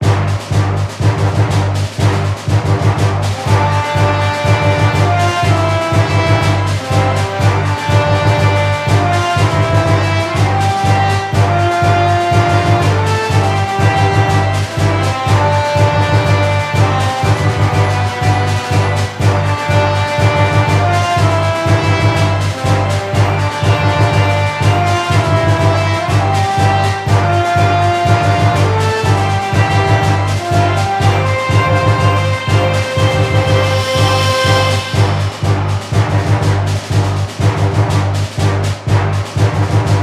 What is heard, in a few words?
Music event; Power Pro Kun Pocket R